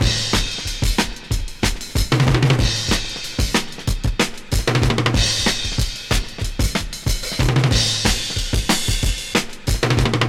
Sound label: 93 bpm HQ dance breakbeat A key; drum loop